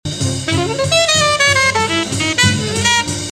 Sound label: transition line